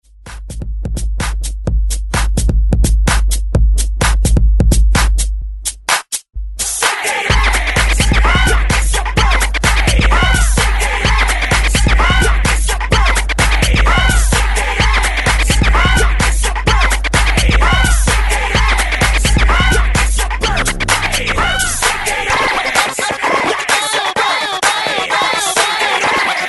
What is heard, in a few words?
Re Drum Scratch 128Bpm